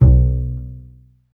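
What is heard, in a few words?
STR CBS, Solo Instruments, double bass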